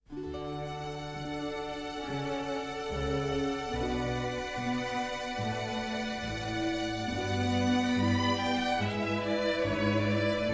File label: Orchestral Suite 3 In D; Bach